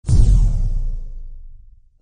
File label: asteroid; crossyroad